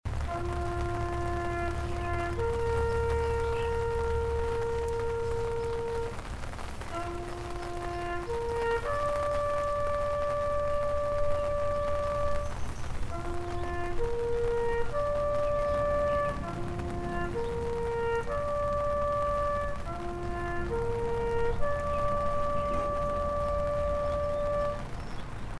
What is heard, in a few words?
Taps; memorial day programs honor those who died in service